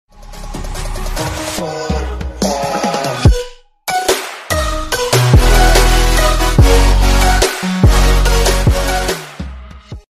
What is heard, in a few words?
alert sound; twitch hitbox